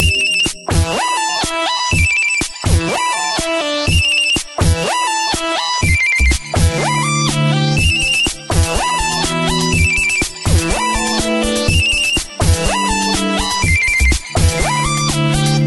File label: bike mode ringtone; Samsung; Galaxy A7 2016